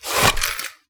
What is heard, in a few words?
board draw; hammer; melee; tfa starwars melee weapons pack